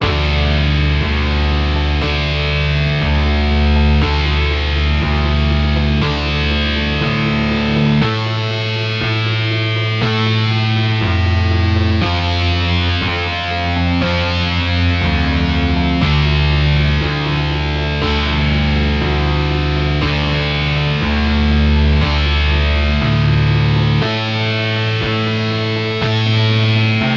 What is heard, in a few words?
Generative Boomp fx no drums another bass effect